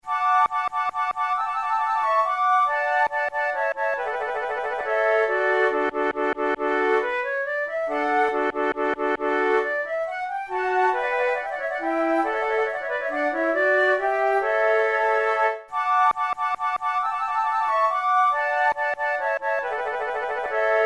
flute trio menuet; quantz

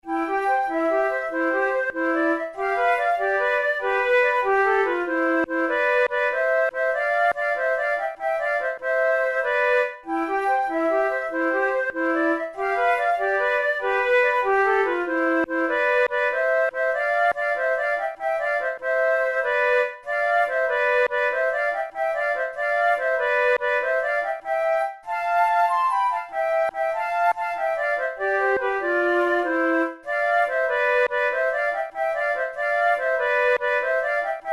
pour la flute duos allegretto

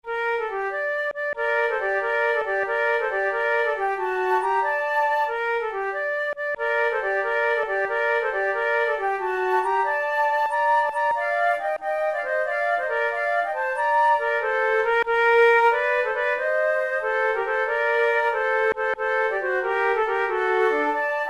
flute

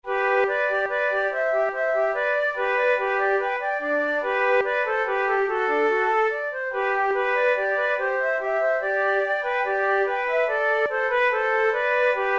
duet

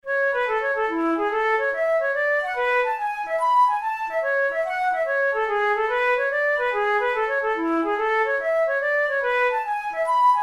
hornpipe, flute

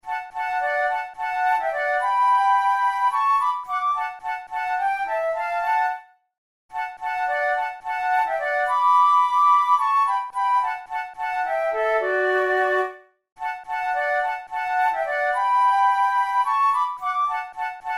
duet, flute; moran; the carrier pigeon

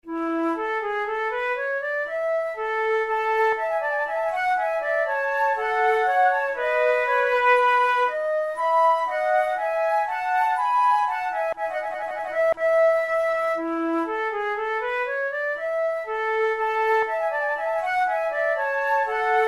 courante

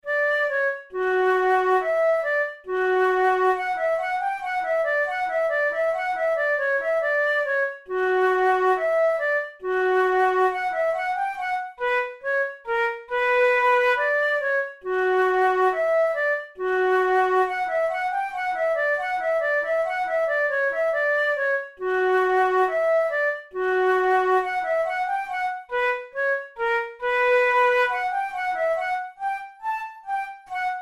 rondeau, flute

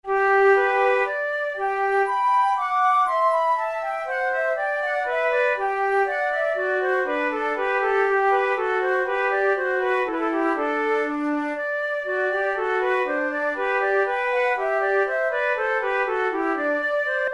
prelude, flute

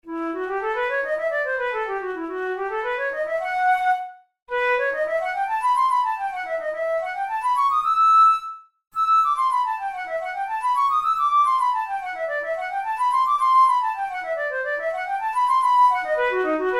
studies for the boehm flute; drouet 72

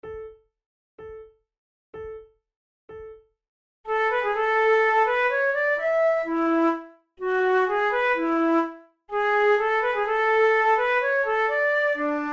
sonata for two flutes; in a major; andante

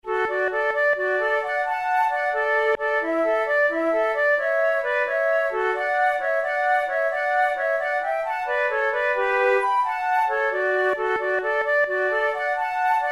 duet

